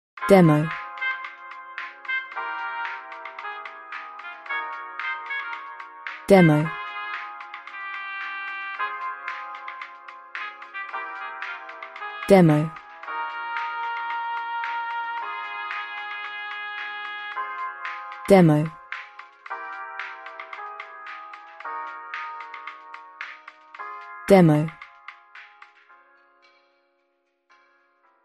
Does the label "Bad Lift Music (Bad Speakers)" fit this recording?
Yes